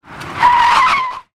skid

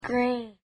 grain

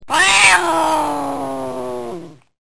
angry cat

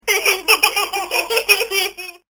laughters

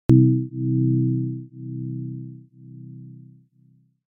bong

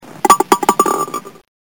golf ball

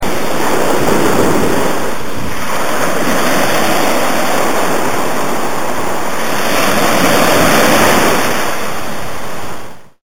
ocean

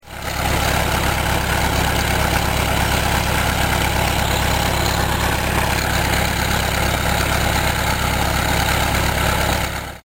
pump

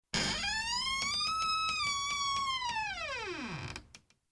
squeaky Door

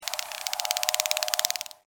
red-winged grasshopper

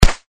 RIFLE

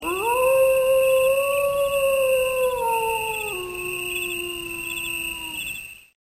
wolf song

